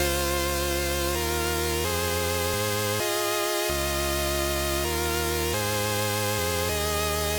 main melody; Effectrix; EDM

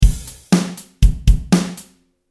Drum Quantized

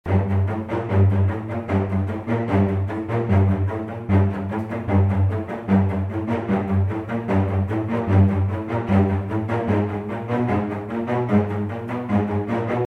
orchestration doublings celli; epic orchestral track

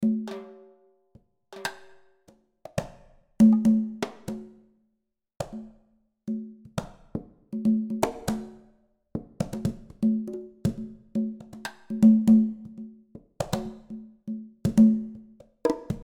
basic Perc velo Rnd; Ableton Live; percussion

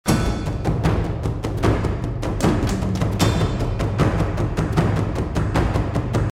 drums midi, MIDI drums only; epic orchestral track

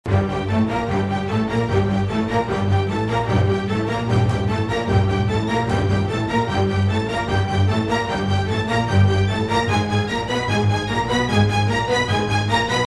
orchestration unison strings, the string section; epic orchestral track

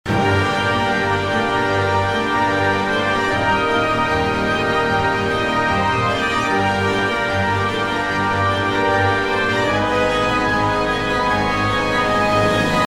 orchestration unison tutti, the whole orchestra; epic orchestral track

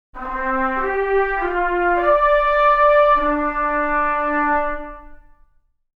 True Legato Off, brass; Cinebrass

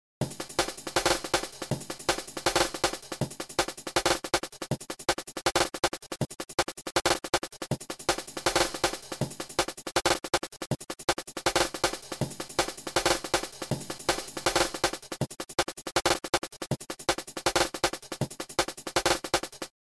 Decay Tweak; drum kontakt; advanced drum editing in kontakt

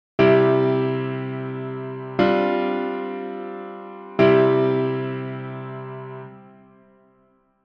I V7sus4 I, altered chords

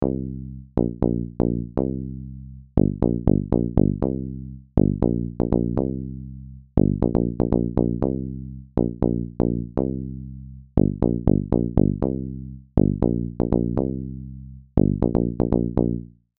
Bass; Logic's Sculpture; realistic bass

